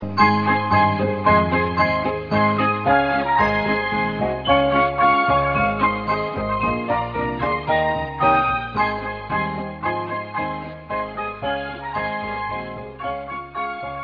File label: jingle